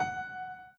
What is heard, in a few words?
Piano Chord